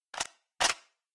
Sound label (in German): waffen laden; minecraft